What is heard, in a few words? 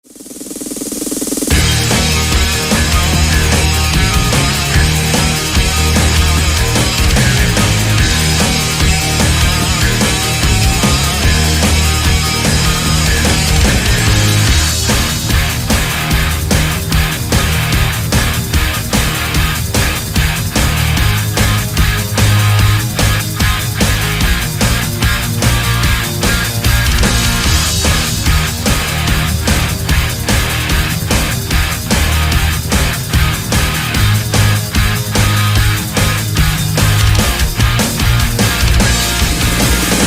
Instrumentals; Serious Sam